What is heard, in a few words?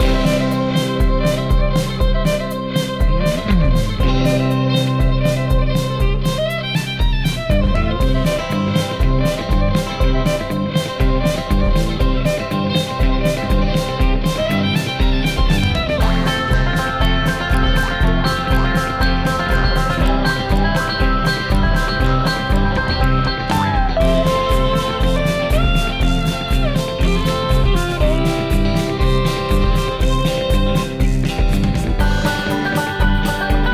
Barnyard BBQ (Gameplay); Cooking Dash